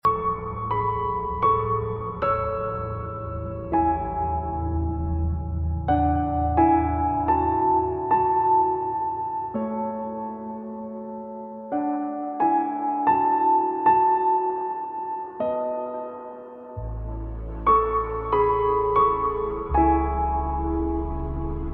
Sad Piano Song

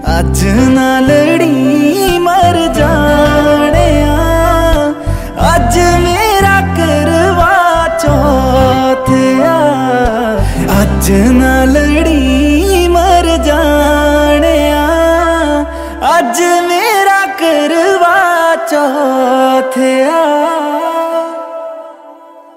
Punjabi Ringtone

